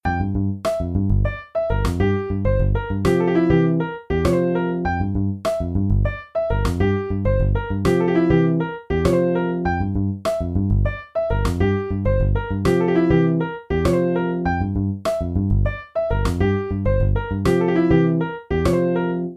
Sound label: with bass claps